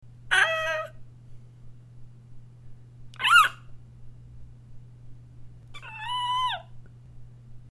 shrieks

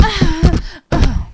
death

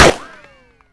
Ricochet, pistol

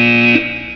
buzz; tuxmath

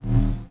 footsteps energy

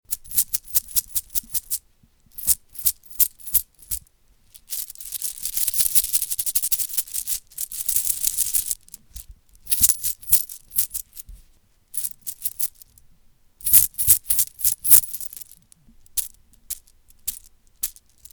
bag of coins

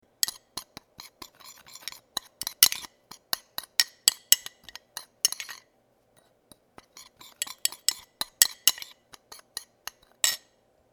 mixing spices in bowl

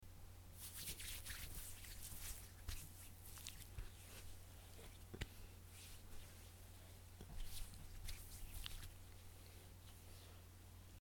moisturizing hands and face

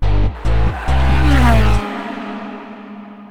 New Highscore; xmoto

